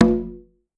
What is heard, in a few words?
Conga Lo, Clavia DDrum AT, Pitched Down